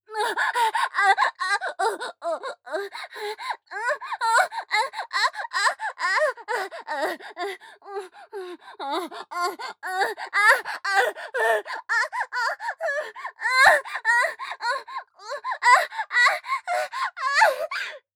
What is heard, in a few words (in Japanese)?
正常位 喘ぎ 加速; ヘレナ